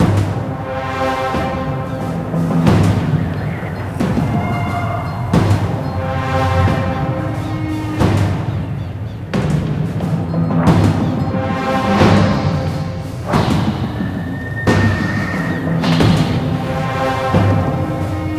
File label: main theme intensity Level; conan